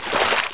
WAT STEP; CHASM

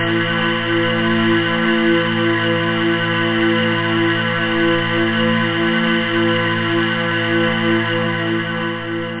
choral, synth; da capo vol1; instruments

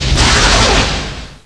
MISSLE

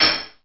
Drums; aloneinthedark4

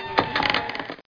cable; Battle of Britain

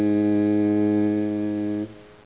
horn

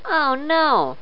lose; PBALL95; 501 Great Games - Volume One; Guildhall Leisure Services

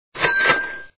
cash; screamin upstreamin

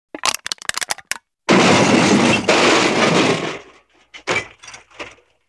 Metal crunch crash

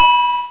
Promote; Missile Math

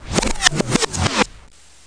REWIND

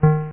tone

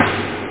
echo, snare; da capo vol1; drums

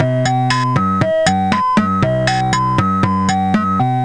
BossaC; Freeze Tag

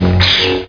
lt saber hit; MOTS

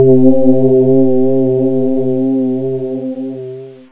STARTUP; SpanishScene3; fermix

